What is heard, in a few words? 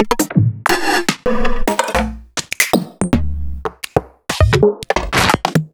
Percussions; Sliced